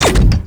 Weapon Switch

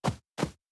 Birds Footsteps Loop

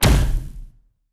Take Damage

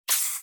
Rattle Snake; Rabbids Multiverse

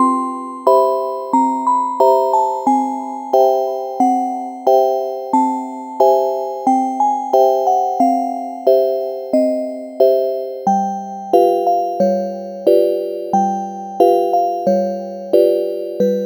Poker Musicbox; gamerip; This Ain't Even Poker Ya Joker

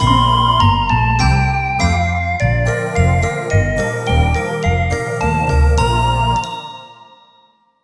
Puzzle Piece Solved; Juufuutei Raden's Guide for Pixel Museum; Memory Pixel

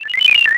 Retro Computer Code Signal

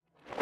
Land Top Down

